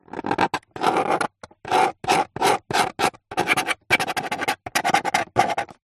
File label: foley small spike scrape scratch thin plastic; zapsplat